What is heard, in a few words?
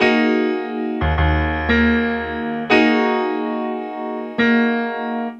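piano, Hip Hop and R&B Drumloops; Best Service ProSamples vol.01; AKAI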